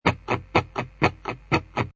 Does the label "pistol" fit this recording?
No